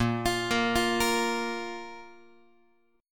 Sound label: Bb 5th Bb Guitar; Bb5 arpeggiated